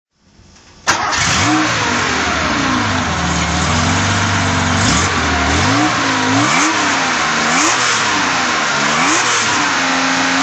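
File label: porsche 911 24t throttle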